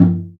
Kendang Slap; Multisamples; Planet Earth; Digital Sound Factory Planet Earth

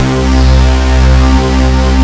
MONDO CHORD, Roland JV80; Rubber Chicken Software Sample Bank

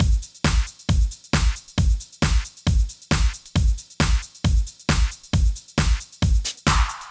Trance Drums Mix 135bpm; Construction Kits; Zero-G Trance Inducer; 90 s Sample CDs

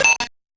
Noise A, 8-bit, Bitty Noises

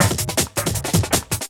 Beat, Industrial

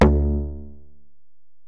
Bell, Alesis DM-PRO

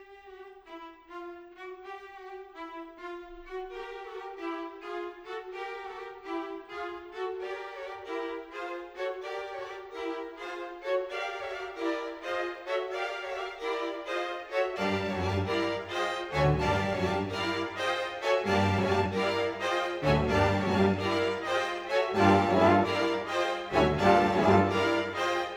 Rock Pop, Orchestral; Sonic Foundry (Sony Creative Software); Orchestral Series VOL-4 - Rock and Pop

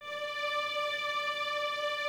Violins D 5, String Ensembles; Miroslav Vitous; LINKS ORCH

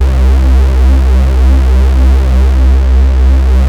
JUN 106, BASSES; Masterbits - Vintage Voltage; VV BASSES

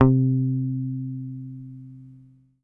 HOF SUS, Hofner bass; Sampleheads; Will Lee Bass Library; HOFNER MUTE

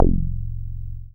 Bass SH-101

